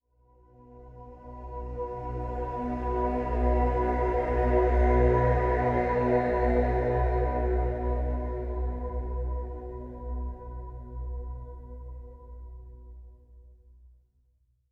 Tonal FX B

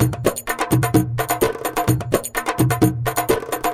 Latin House Loop 128 BPM; Vengeance Samples

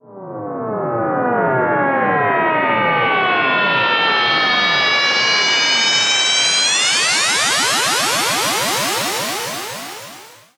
Sweeps Long

